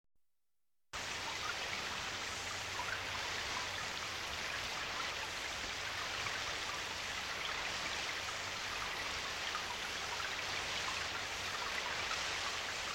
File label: NATURE SOFT MUSIC